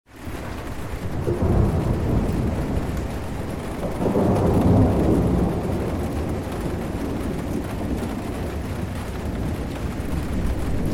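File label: thunder on glass and roof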